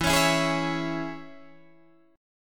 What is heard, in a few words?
E 6th, down, Guitar, Open D